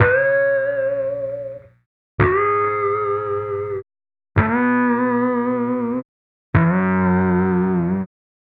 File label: Bass